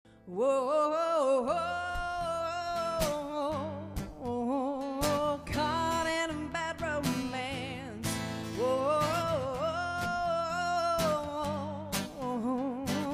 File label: Pop Duo